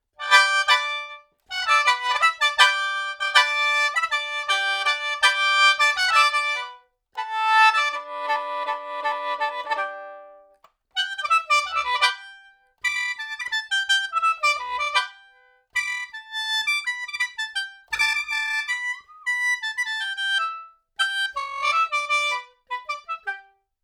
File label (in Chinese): 传统笙 乐曲片段